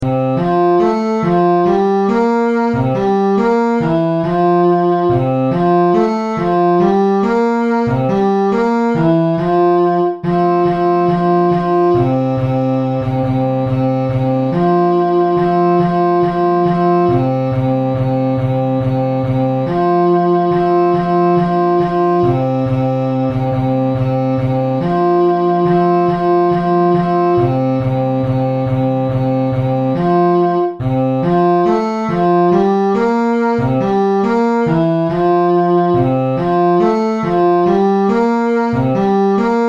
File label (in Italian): melodia bassi; in segrêt